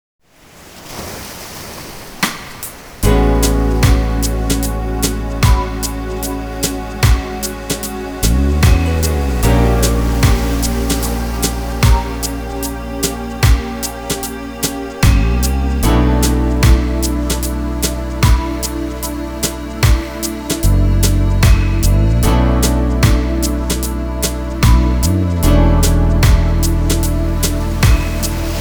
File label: BGM GALLERY; Boku wa Koukuu Kanseikan; Airport Hero Naha; PSP